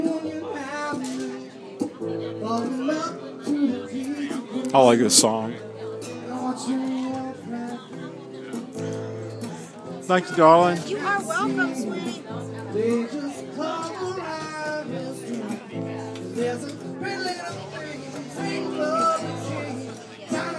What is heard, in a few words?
music